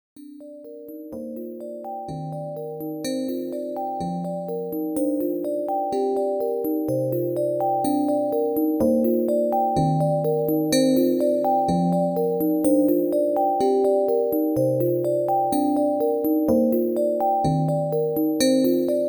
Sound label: simple fm